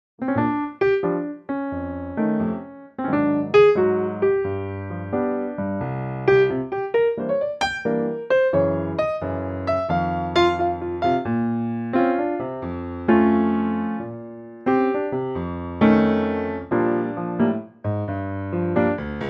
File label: Bb piano; vocal range - Bb to C